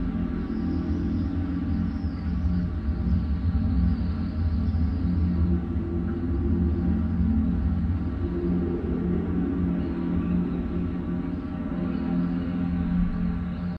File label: Ambience vent; adams venture origins